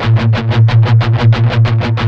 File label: 16TH, Guitars